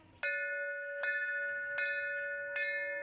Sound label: temple; Nepali